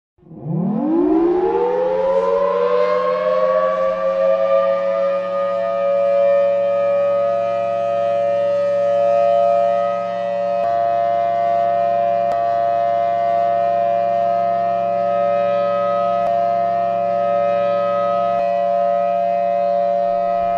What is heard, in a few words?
siren